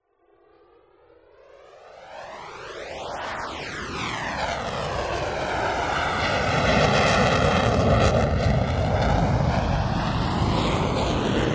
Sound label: five f 16 jet fighters passing from right to left one after the other; sound ex machina